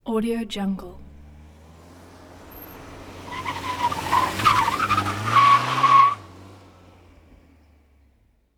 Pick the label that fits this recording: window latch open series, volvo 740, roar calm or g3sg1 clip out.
volvo 740